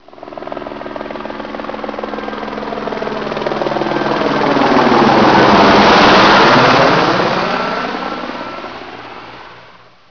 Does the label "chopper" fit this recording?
Yes